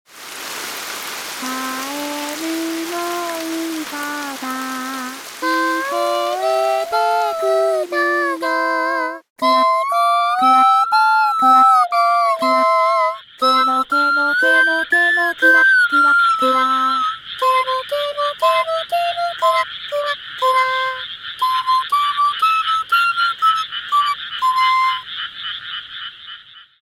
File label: frog round